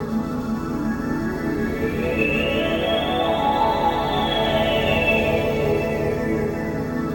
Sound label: Guitar Textures